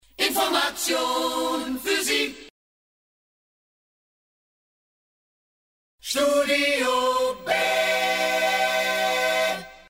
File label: Info Acapella, jingles; DRS 3; studiob